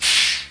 brake, busses; WAV Sound Effects; transpo

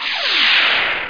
thrust; robotron

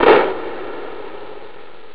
clap; Groovy Bytes: Behind the Moon; DRM PERC

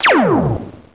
laser boss

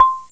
Marimba C6; Hexen Music; Hexen 6vox

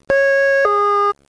Siren; disaster; police

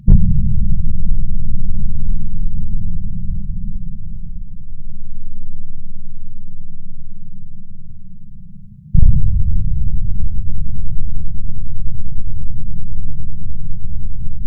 gate tom; fractal musiclab; instruments

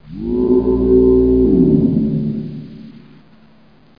door

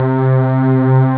seal, synth

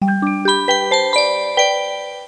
Music

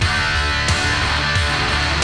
gu riff

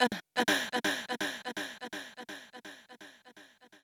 VOICE GRL HOUSE 125

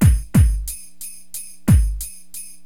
Drums loop, HipHop; LiveAct Pool

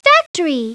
factory; Treasure Galaxy (1994); Learning Company

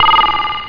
Warning; Trek...Revisited

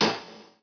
copper miner; Revista CD Expert 45; ZEUS

